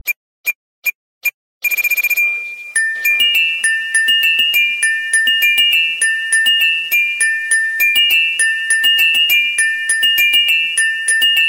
iphone romantic Sms Ringtone

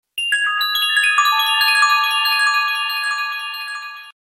Samsung Galaxy Mega Sms

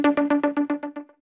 iPhone 4S notification ringtone

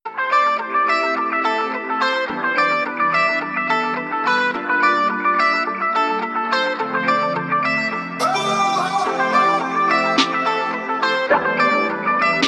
Note 10 Samsung Ringtone

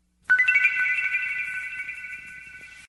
Samsung Galaxy Mega notification

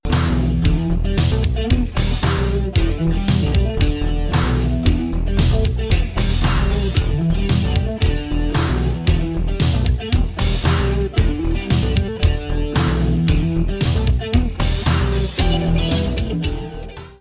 Cool Ringtone; Samsung 2018

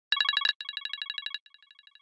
iPhone 3G S Notification Ringtone

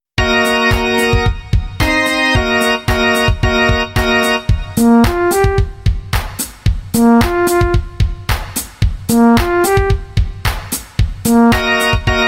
Samsung Galaxy Ringtone

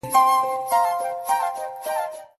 Samsung Galaxy Music notification

